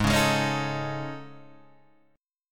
A Major 7th Flat 5th

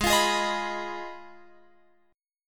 G sharp Minor 6th G sharp down Guitar Open F; G#m6 strummed

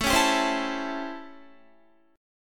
A 7th Sharp 9th A, Guitar; A7#9; strummed; JGuitar

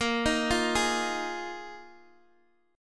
Bb 7th Suspended 4th, Guitar, Open G

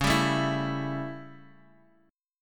A 7th Db, chord

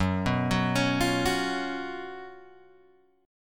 Gb Major 7th Suspended 4th, down, Guitar, Open G

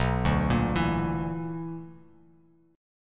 B-Minor Major 7th Double Flat 5th, Bass Standard; BmM7bb5 arpeggiated; JGuitar

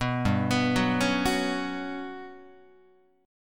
G Major 7th Flat 5th, Guitar; arpeggiated; JGuitar

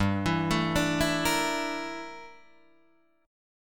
G Minor 6th Add 9th G down Guitar DADGAD

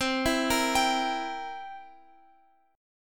C 7th C down Guitar Open F; C7 arpeggiated

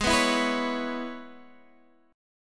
E 6th, G sharp

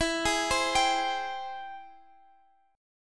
G sharp Augmented Major 7th, guitar

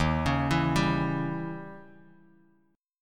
E flat 7th Flat 9th Bass, chord; 11,16,14,12; Bass Standard; Eb7b9 arpeggiated; JGuitar

